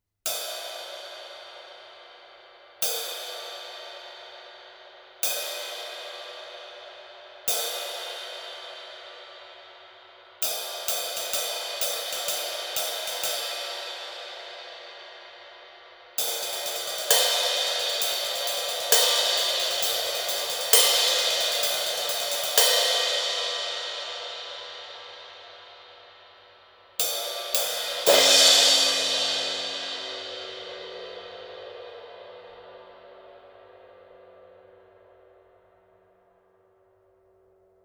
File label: hamm r, cymbals; crescent